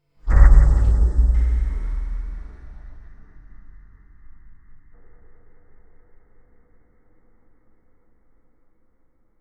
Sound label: accident